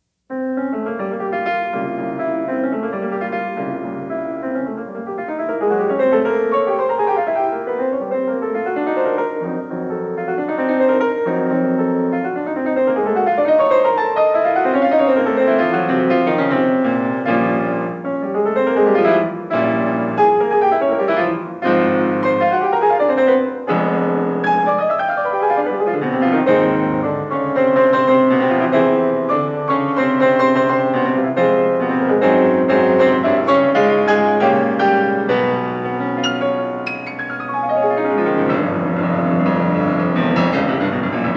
Preludes; Chopin

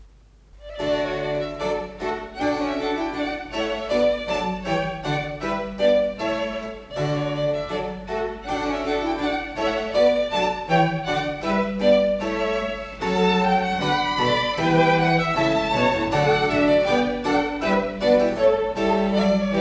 Sonata For Two Violins and Continuo Op5-2; Handel

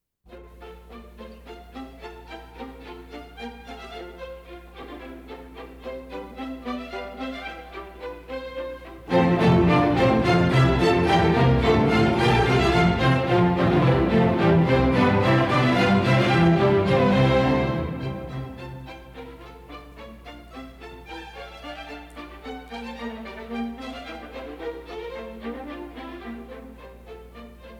Water Music Suite; Handel; Munch

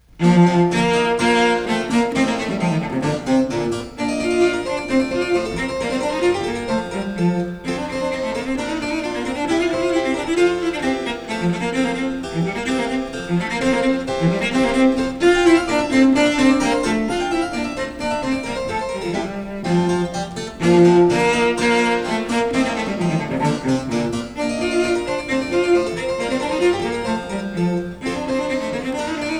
Cello Sonata; Vivaldi; Cello Sonata No1 RV47; Janigro

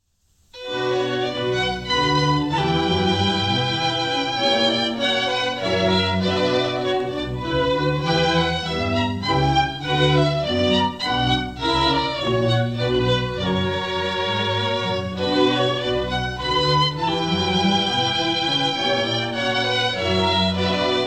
Orchestral Suite No2 BWV1067; J S Bach

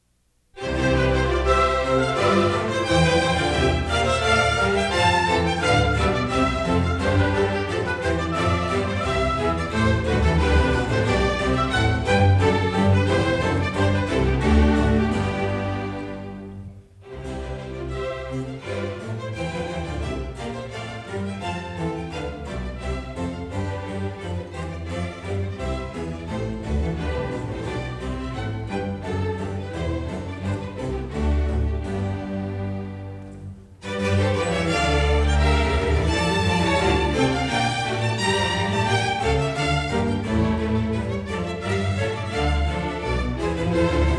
Bach Orchestral Suite No1 BWV1066; Scherchen

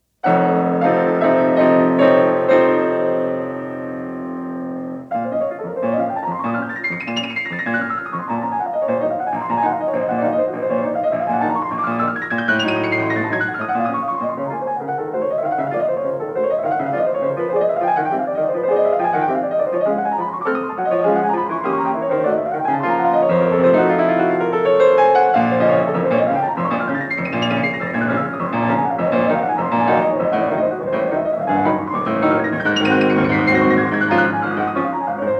Preludes; Chopin

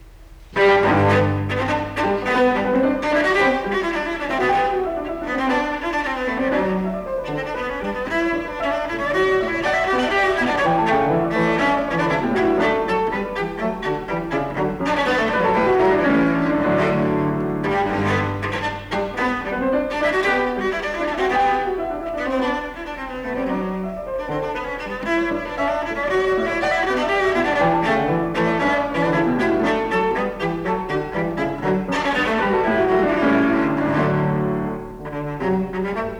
Pieces en Concert; Couperin

